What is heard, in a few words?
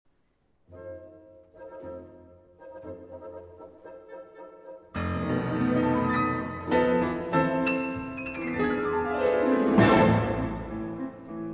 Piano Concerto in A minor III.; Op. 16; Grieg; Allegro Moderat